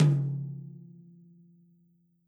Tom Stick Hit Soft Dry; One Shots; Drums

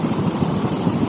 hoverboat roll; There; ThereClient

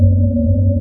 hoverboat turn; There; ThereClient

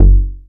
Kick FM Bass

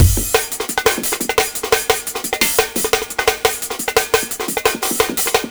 Break Scatty 174 bpm, drums; Loops; Ableton Live 12 Lite; Core Library

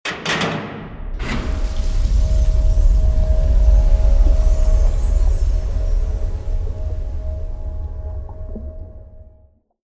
Water Rise; Ruins Quad; Journey

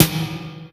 Snare Jungle

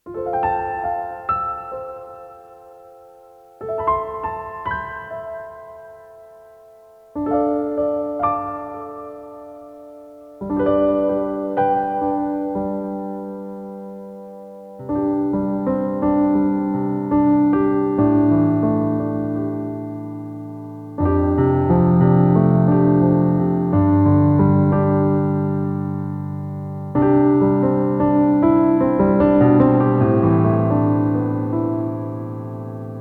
Piano

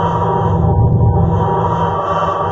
clucky loop; There; ThereClient

